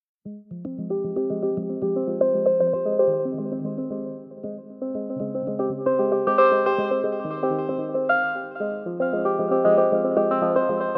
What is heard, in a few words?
Arps & Bells